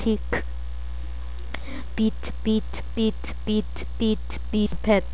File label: beat